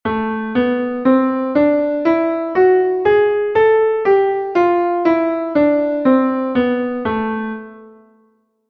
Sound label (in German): a-moll melodisch aufwaerts abwaerts